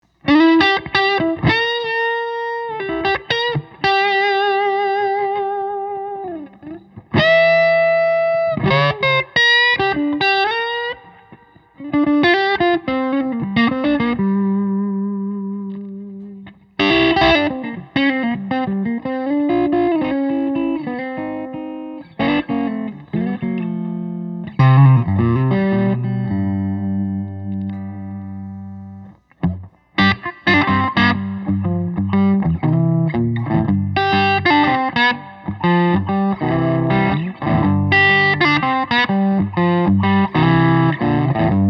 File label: PRS HBii, electric guitars hollow body; wood library; faded blue jean